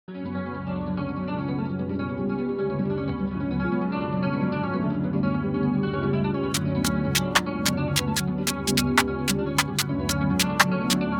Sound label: drill beat